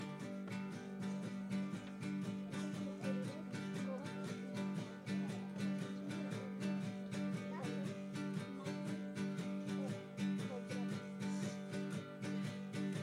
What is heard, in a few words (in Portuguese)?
guaranis trecho aldeia silveiras